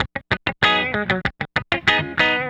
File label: Guitar, 70s Analog Funk; Sonic Foundry (Sony Creative Software); What it Is!